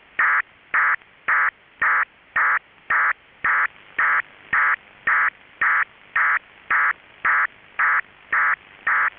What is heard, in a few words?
NORTH KOREAN DIPLO 1200Bd FSK SYSTEM (BURST); KRE-FSK-BURST-1200